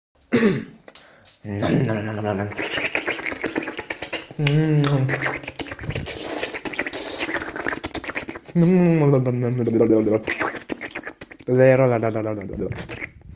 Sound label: hmmmm